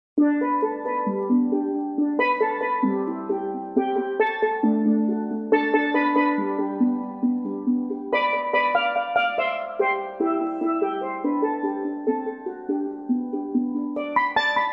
steel pan sax

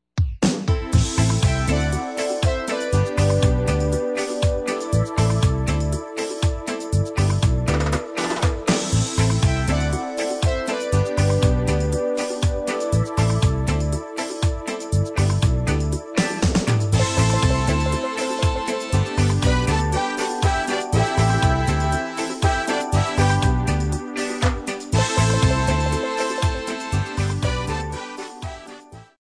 pan meets guitar

